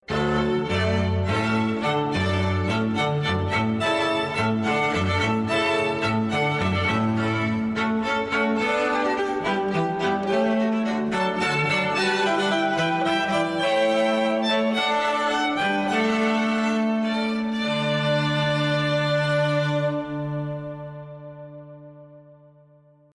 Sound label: Hornpipe Harmony Strings Quartet